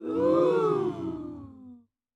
audience impressed ooo

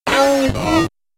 robotic glitch

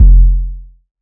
electronic kick distorted

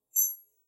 Short Wind Chimes; SoundsCrate

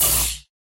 lightsword hits; soundscrate

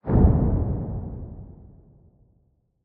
Low Spell Blast Boom; SoundsCrate

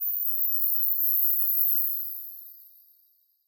fairy dust

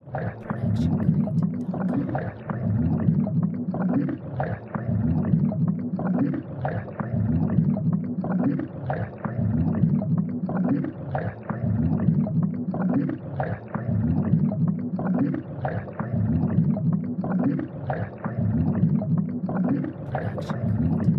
underwater bubbles; soundscrate

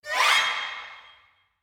short gliss up

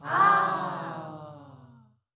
audience impressed ahhh

